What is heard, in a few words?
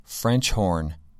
french horn